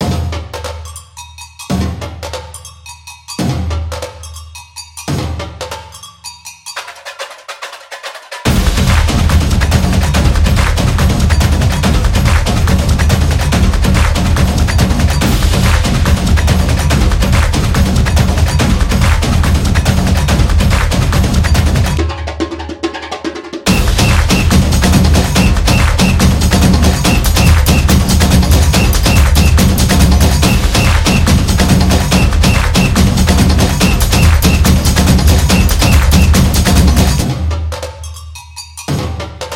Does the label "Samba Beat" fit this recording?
Yes